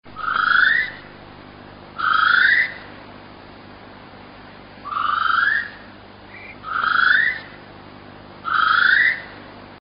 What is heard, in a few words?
great spotted kiwi female